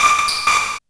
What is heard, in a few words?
MORSE160, Hard & Loud Techno; USB Soundscan vol.01; AKAI